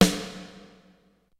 kicks snare, kid; Kid Nepro; Elite; KICKS SNARES

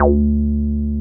303 RES D3M, RES BASS

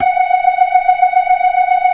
FAST VIB, ORGAN, TREMOLO VIB

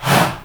HA, SHOUT, Heart of Africa; Spectrasonics; Heart of Africa 1; CH. SHOUT1

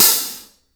Hi Hats; InVision Interactive; Lightware VOL-1

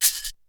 Shake, Drums; ZERO G - Datafile DISC 3

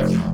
Reese design, bass

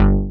PLAY BASS; Pro-Rec - Synthsonic; BASSES

